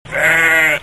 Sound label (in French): Chêvre